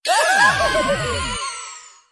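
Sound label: wheel start additional effect; Fruit Fiesta; Wazdan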